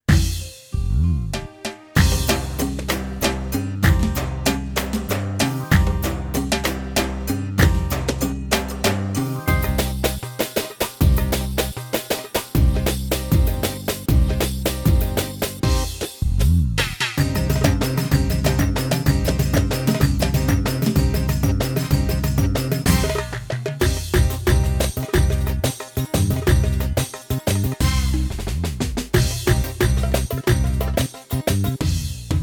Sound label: Iraqi mix